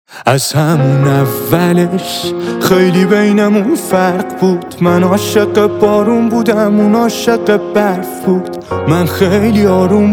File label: Piano Version